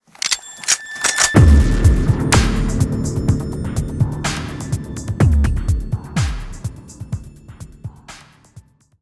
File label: Sounds Cinematics, Marine Introduction